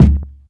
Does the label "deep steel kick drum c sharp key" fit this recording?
Yes